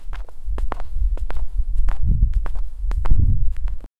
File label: footstep on street